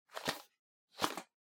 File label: Tablet PC Noise